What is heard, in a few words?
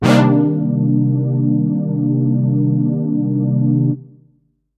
chord chorus air; Bricasti